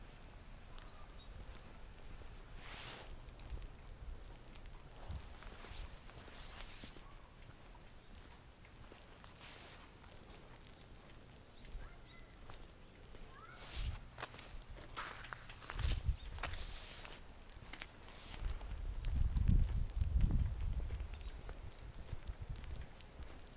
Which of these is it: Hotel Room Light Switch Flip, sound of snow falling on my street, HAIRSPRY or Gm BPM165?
sound of snow falling on my street